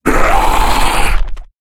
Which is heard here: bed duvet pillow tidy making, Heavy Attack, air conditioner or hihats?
Heavy Attack